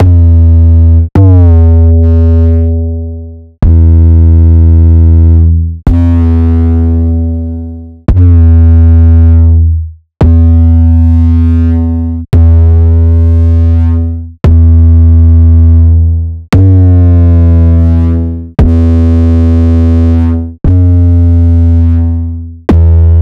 Destructive 808s; monosounds

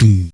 Mounth Perc, Korg Wavestation

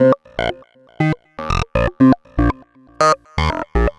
Synth

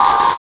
Jungle Kick

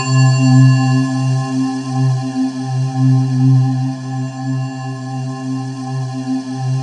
C3, Synth Leads

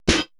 PERC MIX

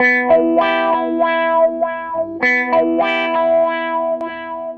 SG Guitar Loop

